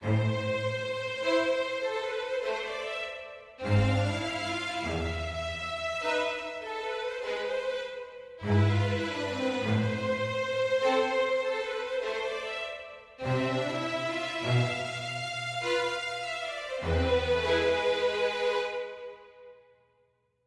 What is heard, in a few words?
mysterious forte